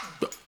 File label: Puppy Talk; Spectrasonics - Supreme Beats - World Dance; Puppy Ratchet